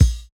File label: Kick, jungle breaks drum sounds; ORIGINAL JUNGLE BREAKS; JBK KICKS